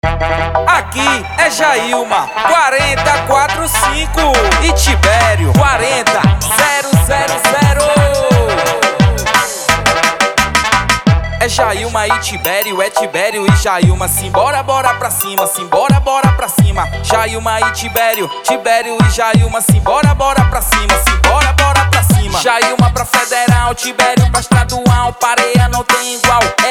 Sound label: funk